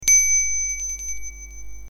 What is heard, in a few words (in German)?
Fahrradklingel; Permanent